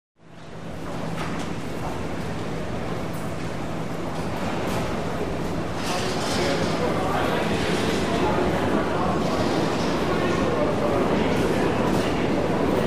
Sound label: Subway Platform Wide, subway